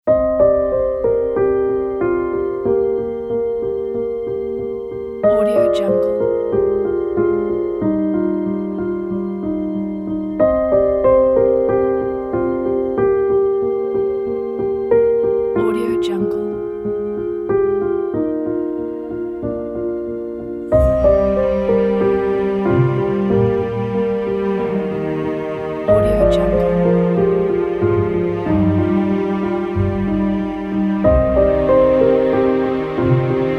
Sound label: emotional and sad love story